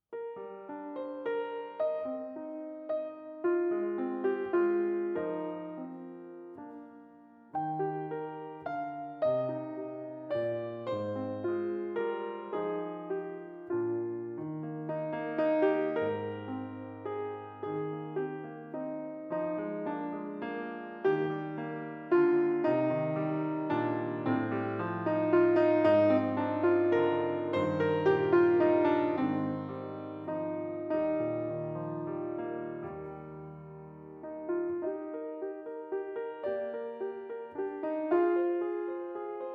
accompanyment only

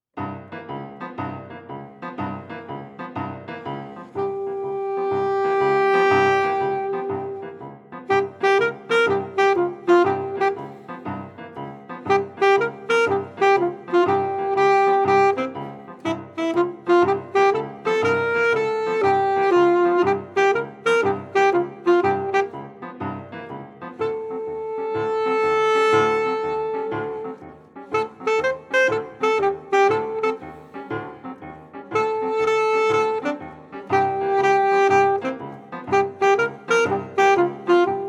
jazz music

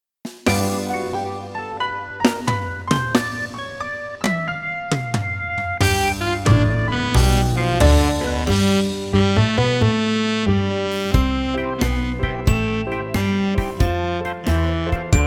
clarinet

